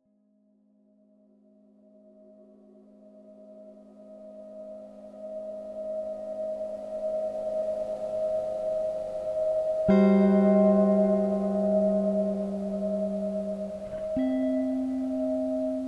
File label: Small Piece for Guitar